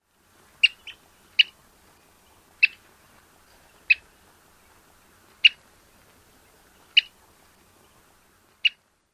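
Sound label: Wilson's plover chirp calls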